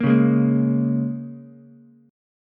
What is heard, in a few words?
Bb Minor 7th, down, Ukulele; Bbm7 strummed